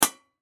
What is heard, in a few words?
Teapot Metal Close, Teapot Metal Close Lid Antique Tea; Household; Teapot Metal Close Lid Hard Antique Tea Kettle; Dishware